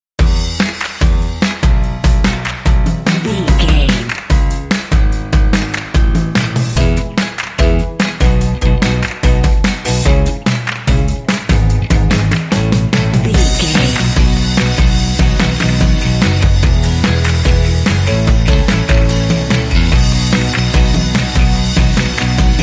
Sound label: jingle cutey catchy bed